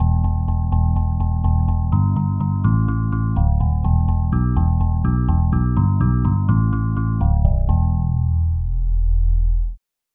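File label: electric piano bass; legend ep 2; SpectMorph Evaluation #4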